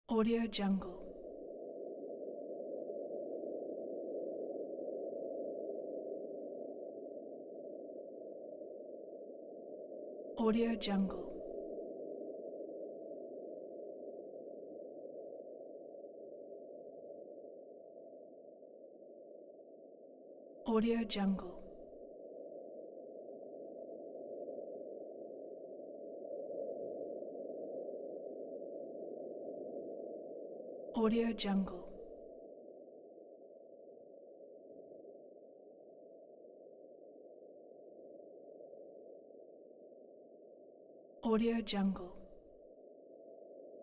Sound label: mountain winds ambience